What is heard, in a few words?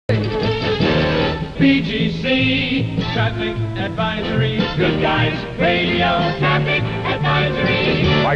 jingles traffic advisory